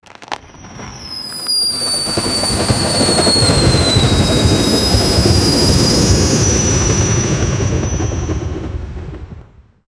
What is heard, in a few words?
parachute opening, falling